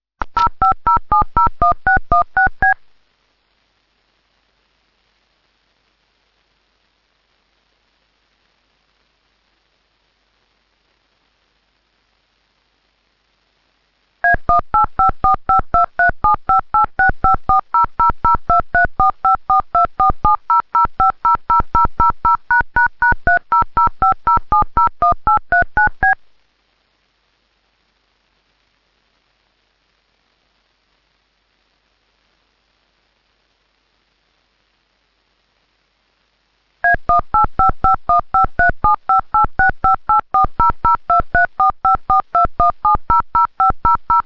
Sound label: dtmf